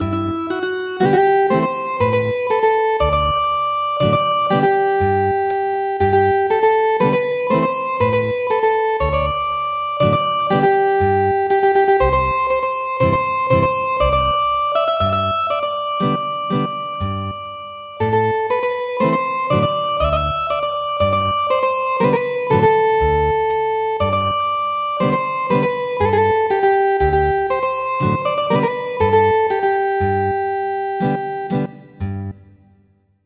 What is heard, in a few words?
Ethnic Guitar, traditional; Muran of England